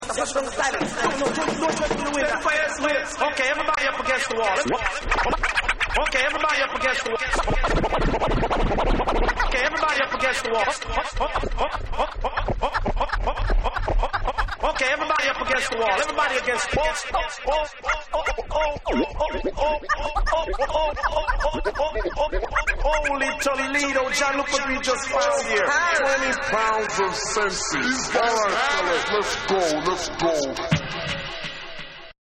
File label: Scratch apella